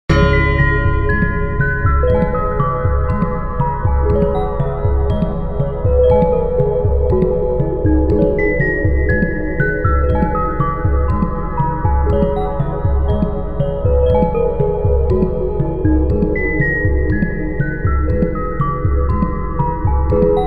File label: chill jungle